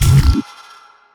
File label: portal invalid surface, portalgun; garrysmod